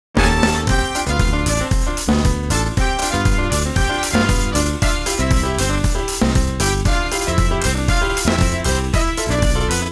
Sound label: Jazz, WSOLA; TSMtoolbox